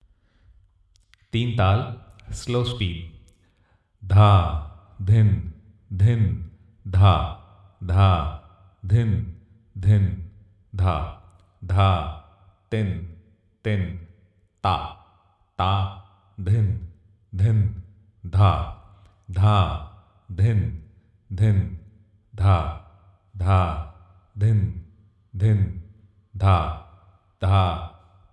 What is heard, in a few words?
slow teen taal